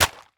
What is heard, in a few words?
Iv Bag Set Down Hard; Medical; Blastwave